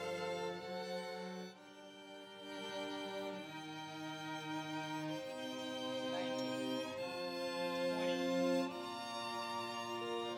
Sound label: Overhead